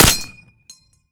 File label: m1 garand last shot; weapons